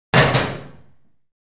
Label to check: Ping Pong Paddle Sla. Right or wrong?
Right